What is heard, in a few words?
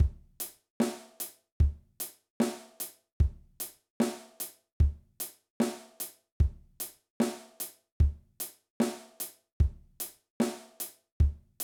Reggae Beat, drum